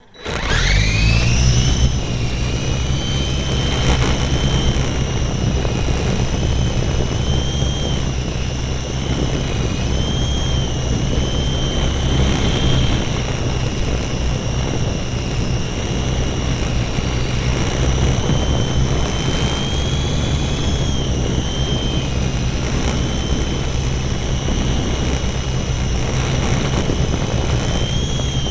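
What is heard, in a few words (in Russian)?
zvuk radioupravlyaemogo vertoleta, sound of a radio-controlled helicopter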